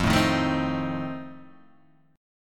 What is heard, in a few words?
F 7th F down Guitar Open G